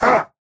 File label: pain small; Slork